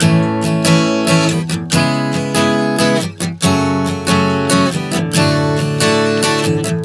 A-C#m-F#m-D 140 bpm, acoustic guitar chord progressions